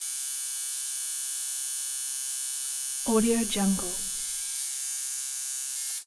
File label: tattoo needle operate; prosoundfx